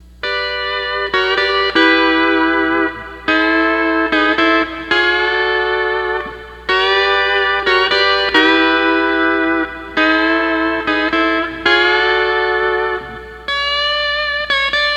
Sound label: 12 String Lap